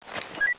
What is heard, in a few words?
bunker buster draw, weapons